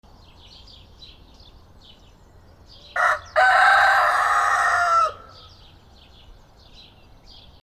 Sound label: Rooster